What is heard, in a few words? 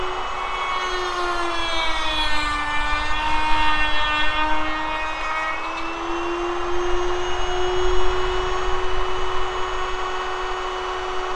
circsaw; ragemap2023